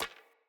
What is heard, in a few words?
Hunter Bow Grab, Weapons; Player; Bows; EnvoyHunterBowRangedMelee